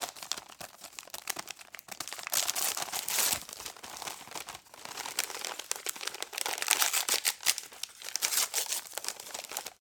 open healthy crackers packet; Snacks

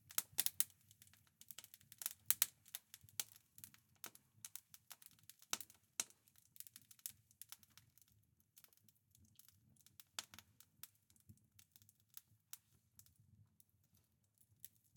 Fire Crackling In A Woodstove; UberDuo; The Cabin Audio Playset

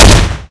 smg fire, weapons; hl2

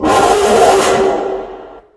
gunship pain; hl2; combine gunship

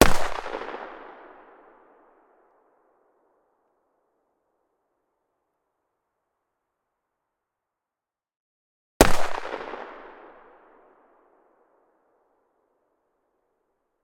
Lynx 50 - FIRING - 4m Left, Sniper Rifle; Pole Position; Lynx 50 Cal Sniper Rifle

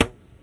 wire; hl2

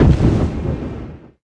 explode, antlion guard far; hl2

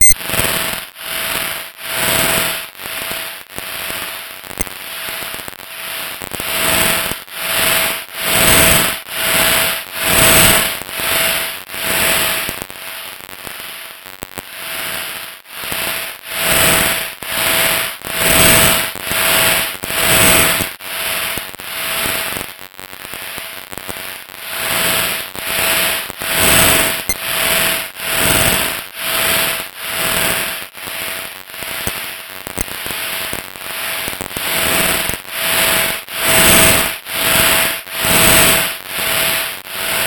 Electrical Current Texture; Soundopolis; Sci-Fi Electric

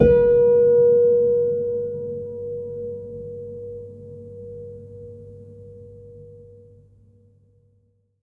EDUcon B3, Keyless Piano Body; Detunized; The Keyless Piano Body

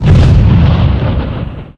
explosions; hl2; impact

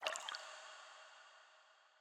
Single Drip Design, Cave; InMotionAudio

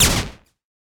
heavy hit, Laser Guns

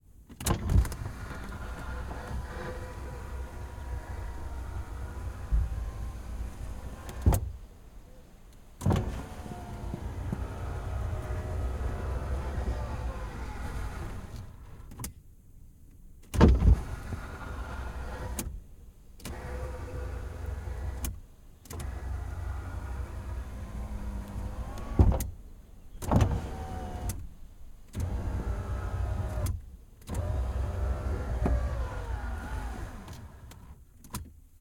Pontiac Trans Am 84 - Window; Pole Position; The Vehicle Doors and More Library; VAR SFX; MS Decoded RSM191

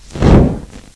flame, weapons; hl2

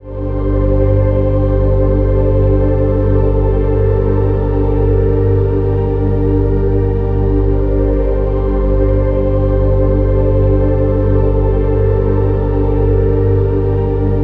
ambient synth melodic texture; BluezoneCorp; Afterlife - Ethereal Ambient Samples

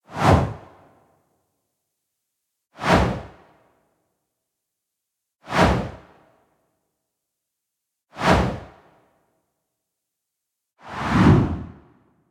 Whoosh Short; Justsoundeffects; Transition Whooshes Vol. 1

